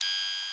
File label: airlock control; cardassian